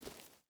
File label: footstep burlap gravel walk; Rust